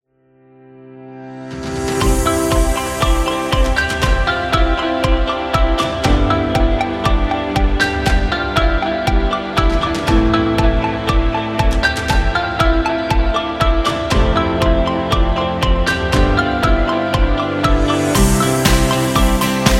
Video Background; agency promo

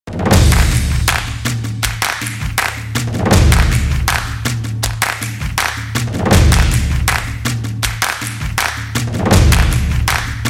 A Football Music, percussive

